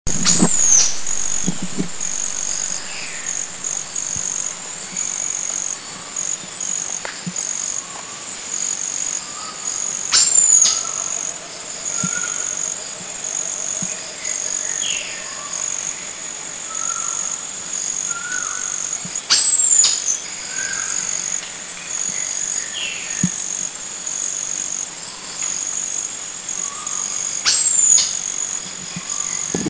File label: Scale-feathered Malkoha, Philippines